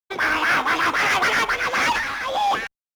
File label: Zuni Yell